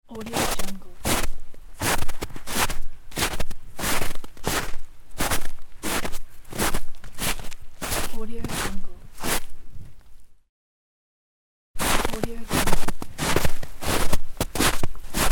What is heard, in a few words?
walking on snow